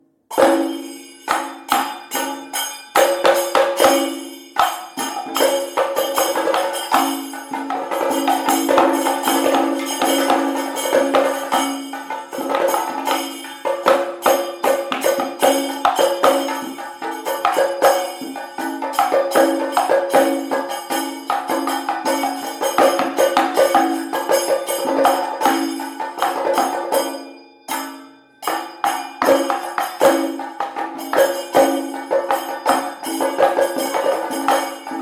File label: kerala drums; loopzone4